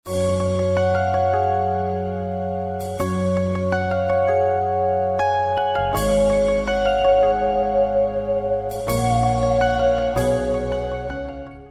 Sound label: calling tone ringtone nokia; nokia ringtones